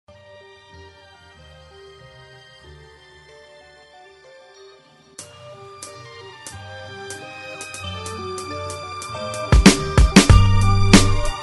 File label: Beats